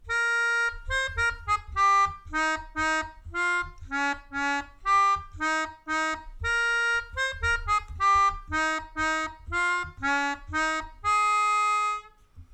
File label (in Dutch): Daar wordt aan de deur geklopt, Melodica